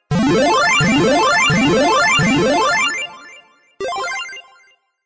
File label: flip win